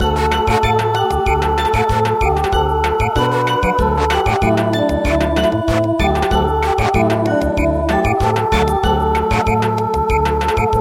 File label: Samba